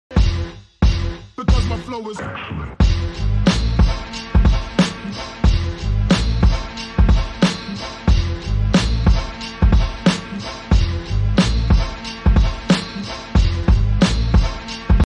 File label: hip hop; موسیقی هیپ هاپ بی کلام